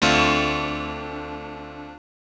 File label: Coin; Gun Frontier